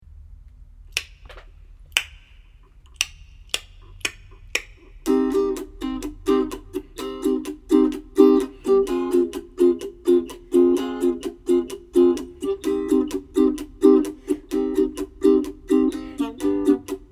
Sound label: C minor chords